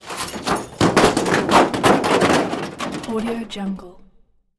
metal small object hitaway; matter mayhem; soundmorph

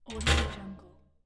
industrial machine heavy metal door slam

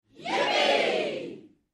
medium studio audience shouts yippee

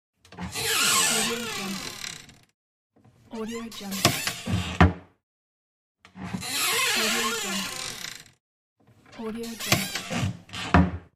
wardrobe doors closing and opening

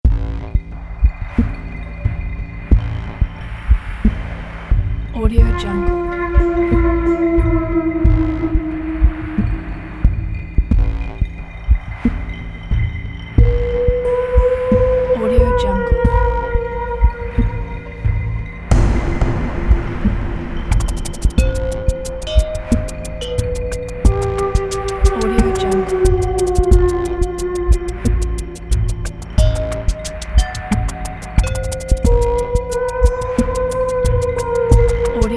dark stalker eerie creepy

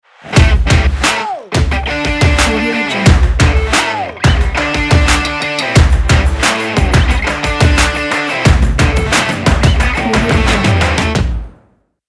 sport opener logo

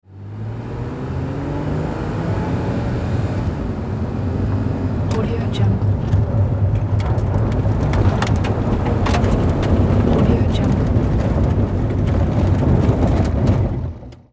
vehicle pavement gravel high speed mix

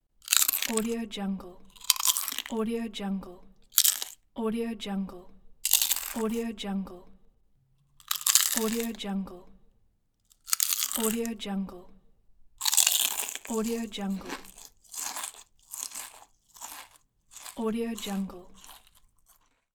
crunches; moonaudioproduction

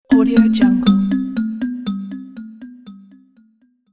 calm pleasant notification

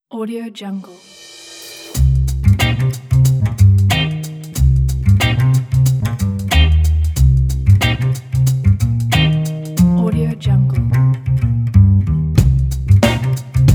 funk groove

